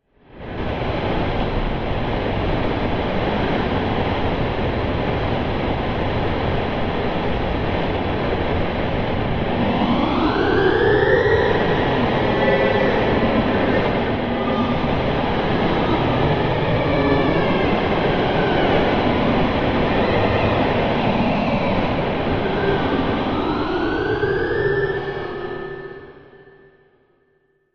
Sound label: Music Eerie Psycho, guitar